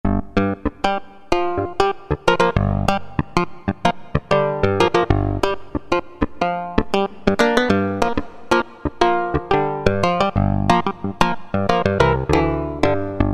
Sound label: Clav, Roland MKS-50; Factory examples